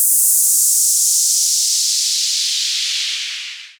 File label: Downlifter; VEDM2